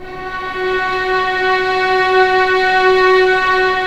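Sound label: violins; String Master Series; Vlns 6 p-mf; Vls6 p amb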